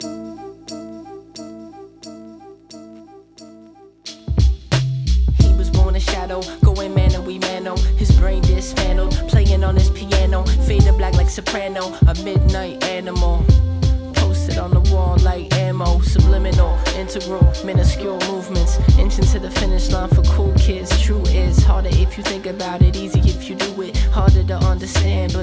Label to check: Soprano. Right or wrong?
Right